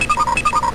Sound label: comm beep